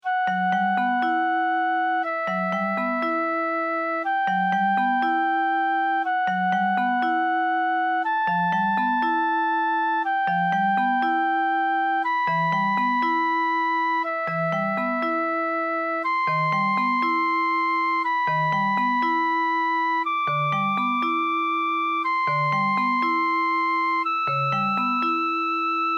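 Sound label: images flute & guitar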